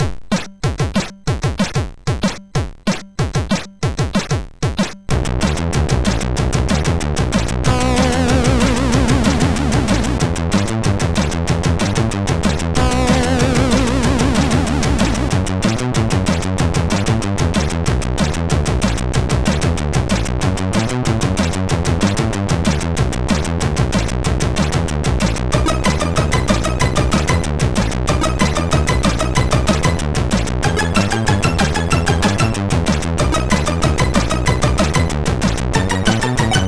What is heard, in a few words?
Mean Rock, sid MOS6581R4